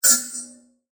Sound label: Tank Expel High, Scifi, Tank, Expel, High, Pitch, Flange; Science, Fiction; Spacecraft; BlastWave